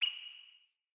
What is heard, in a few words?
Cardinal Male Bird Impact Chirp